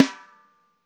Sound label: LOFI, LO-FI KITS